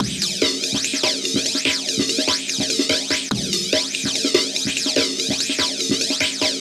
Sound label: Jungle, Drums & Percussion, Electronica; Sonic Foundry (Sony Creative Software); Electronic Point Blank